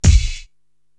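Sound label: bass drum Deafness, Korg TR-Rack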